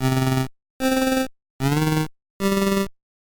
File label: instrument volume